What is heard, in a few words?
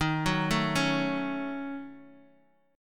D sharp Minor 7th Flat 5th, Guitar, Open D; x,6,4,3,4,x; chordshape